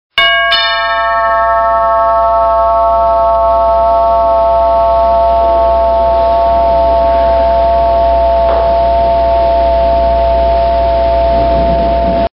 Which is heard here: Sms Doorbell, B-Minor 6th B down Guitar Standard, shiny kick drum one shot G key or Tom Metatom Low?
Sms Doorbell